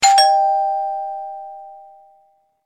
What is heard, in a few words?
trick jump ok